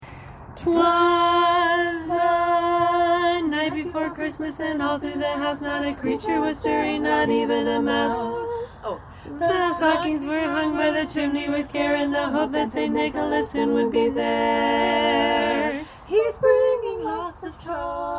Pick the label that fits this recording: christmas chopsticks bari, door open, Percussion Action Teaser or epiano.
christmas chopsticks bari